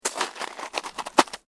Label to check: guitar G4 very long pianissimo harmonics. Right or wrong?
Wrong